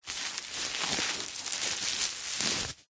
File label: plastic packaging film bubble wrap handling movements; office